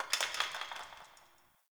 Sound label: Brick Crumble; Ambience; Warframe; Nineteen Ninety Nine